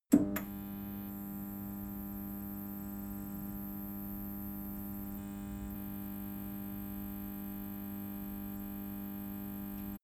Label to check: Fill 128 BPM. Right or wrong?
Wrong